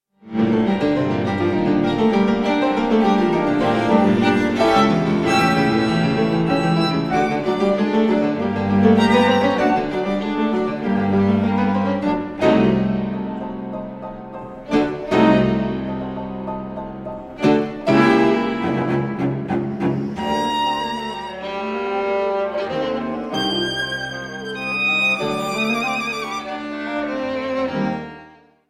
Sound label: quartet for violin viola cello and fortepiano; carl maria von weber